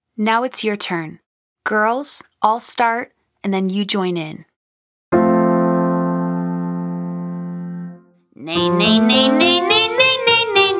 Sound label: Nay Nay Nay Double Long Scale Female Version